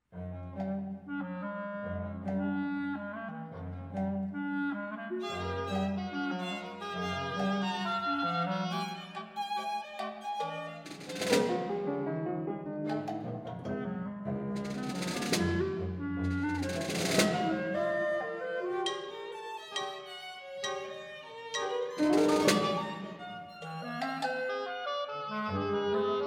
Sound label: A Bantam Rooster